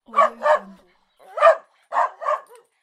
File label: dog